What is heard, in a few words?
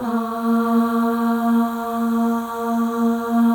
Voices, FEM AHS; Spectrasonics; FEM.1 AHS